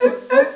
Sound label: SEAL